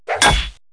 Bat hit Golf; Rabbids Big Bang; Android iOS Windows gamerip 2013

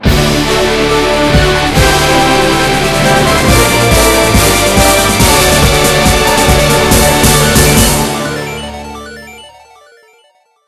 track switch finish last level; mighty switch force hyper drive edition wii u windows gamerip 2012

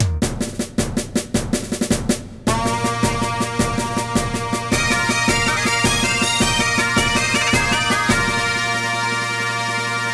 Tournament Finals Fanfare; Ponkotsu Roman Daikatsugeki Bumpy Trot; Irem

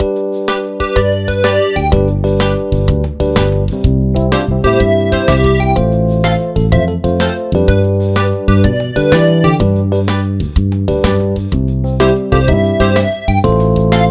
Shells Music; benjamin bluemchen ein tag im zoo; nds gamerip

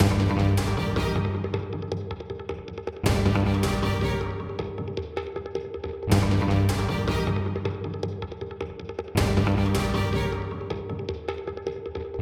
Tornado Platforming MIXED; Ben 10; switch gamerip

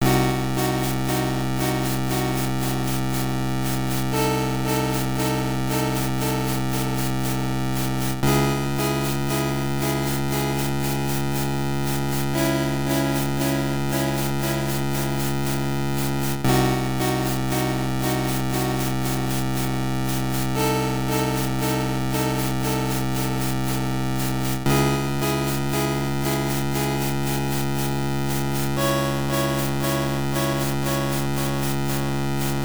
Ominous; front mission wonderswan color gamerip 2002

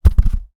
item drop

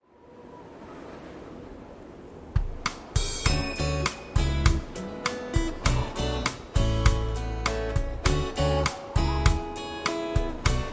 Stage Music, Wind; Yoshi's Woolly World; Stage Music 1 (Unused - Wind)

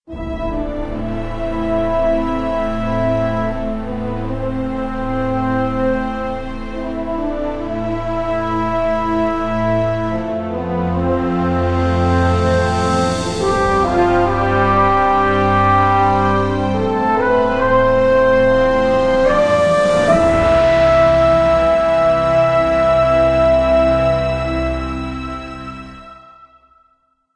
bgm map background; devils demons android game music

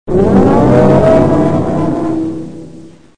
Alarm; Black Navy War 2

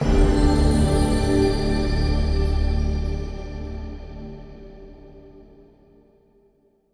Stingers sting gain huge; Hand of Fate 2

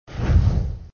jetpack

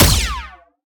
Sciweap Shot Small Laser Punchy Needler

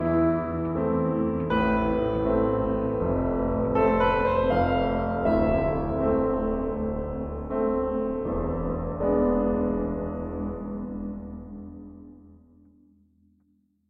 Jingle Victory Normal Ending

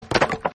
skel die sound; Arc of Templar

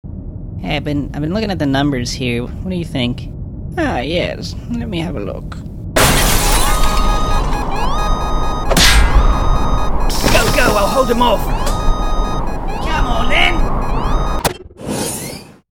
Cannonball; Infiltrating The Airship

